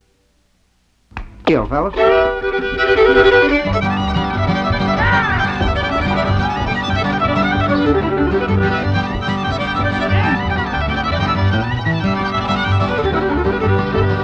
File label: Instrumental; Bluegrass